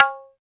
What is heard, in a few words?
DM5 Perc, Alesis DM5 drum machine